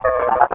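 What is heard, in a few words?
droid sentry hit; Star Wars Knights Of The Old Republic I; XBOX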